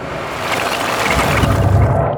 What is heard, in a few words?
turn to stone; weapons; dota; medusa